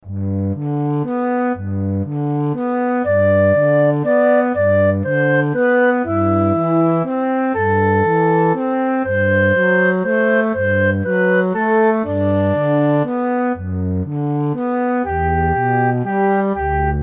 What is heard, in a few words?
Flute Cello, instrumental; I am using the flute voice (playing down low) for the cello.